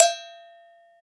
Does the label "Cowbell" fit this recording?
Yes